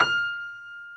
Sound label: Piano Stereo F 5; STEREO G-PF 1